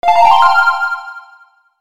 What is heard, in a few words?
Lap Jingle; Summer Party Time